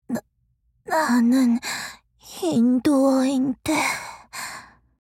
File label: death; chasers; bellona